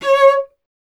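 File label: strings cello C#; Miroslav Vitous; Solo Instruments VOL-1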